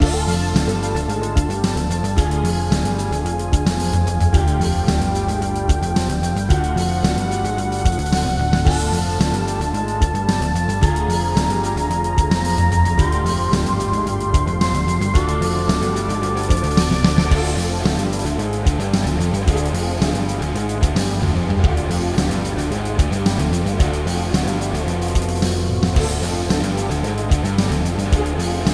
music game; spider man mysterio rush game; central park

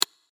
click; inlogic-checkerslegend

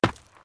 land; ninjarun

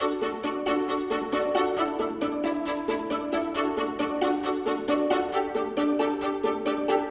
menu; monstergo

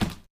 object hit cone; lightning speed

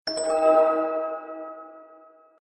hint use; inlogic-zootrivia

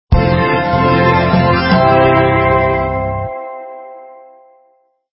win; inlogic-castledefense

